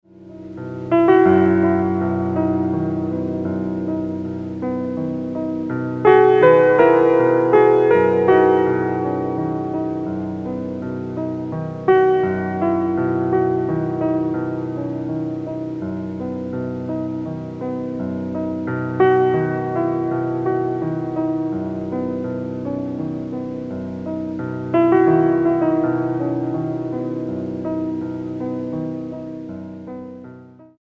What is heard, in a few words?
meditation